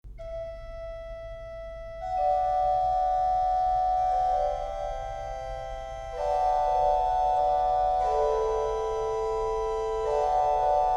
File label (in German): Improvisation (orgel)